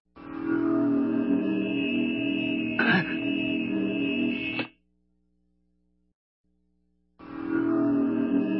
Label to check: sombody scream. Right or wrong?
Wrong